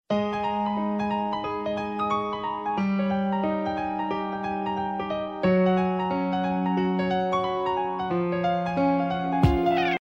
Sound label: Sonata Piano